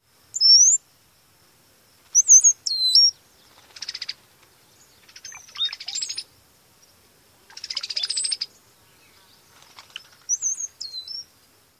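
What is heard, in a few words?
Brown-headed Cowbird whistles, chatter, and flight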